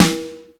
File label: Snare, Drum Hits